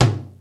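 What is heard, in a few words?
low tom; MPC-60 DRUM2; Greytsounds VOL-1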